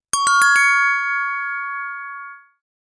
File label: Winning bell